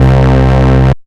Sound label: Bass Note C; House; Best Service; House Definition VOL-1; Basses